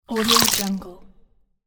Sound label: biting with blood